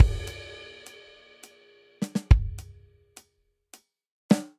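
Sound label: Country Intro 209 bpm, drums; 4 4; MIDI Clips; Ableton; Factory Packs; Drum Booth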